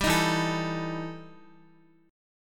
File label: E Minor Major 11th E Guitar; EmM11 strummed